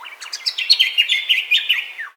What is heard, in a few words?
amb bird call